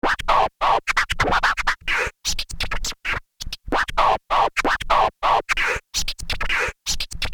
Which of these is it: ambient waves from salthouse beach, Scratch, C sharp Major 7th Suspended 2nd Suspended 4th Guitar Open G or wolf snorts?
Scratch